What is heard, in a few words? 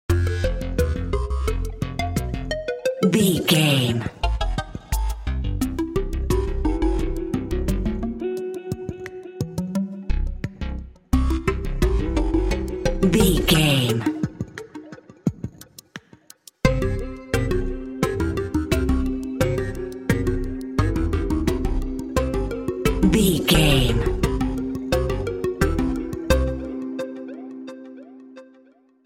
plucky curiosity